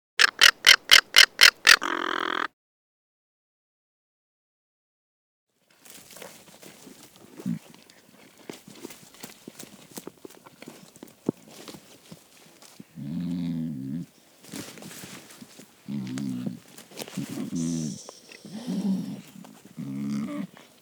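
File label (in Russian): кондор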